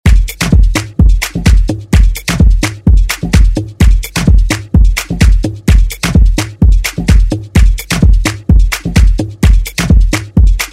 Afro House Redrum

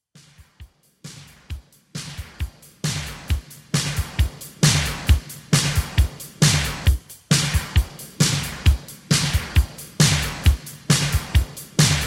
Intro Outro 134 Bpm

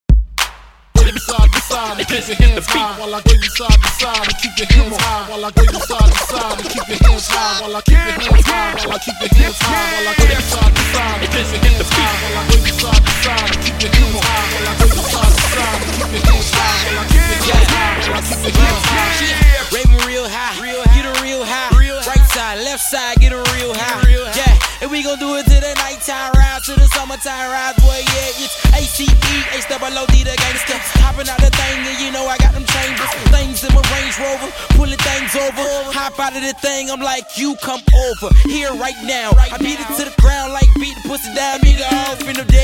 Scratch Intro Outro 104Bpm

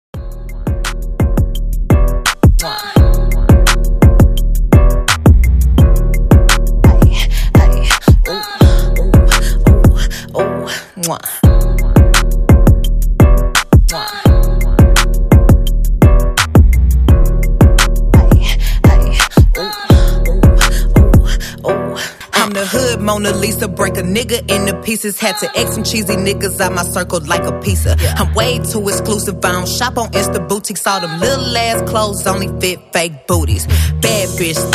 85 bpm

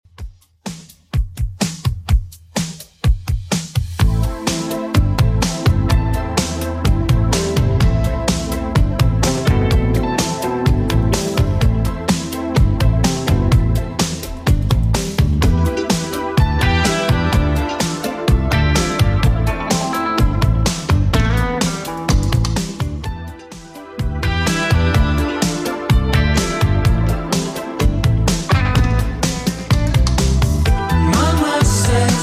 80s Redrum, 126bpm

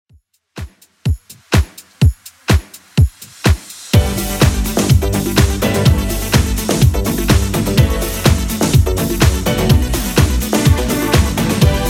80s Redrum 125 bpm

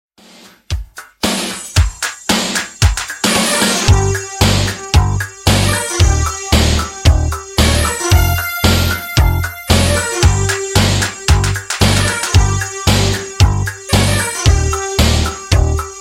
Funk Redrum 113 bpm

